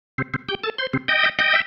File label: Single Riff, Organ